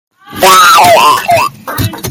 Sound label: frog crying